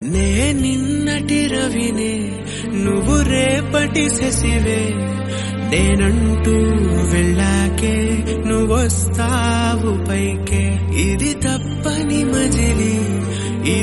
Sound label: telugu song